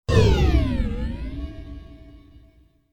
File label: Power Down